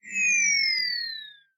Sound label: fall; vex7; games